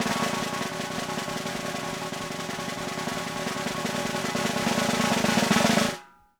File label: PRESS ROLL, CHRM TAMA; Sampleheads; New York City Drumworks VOL-1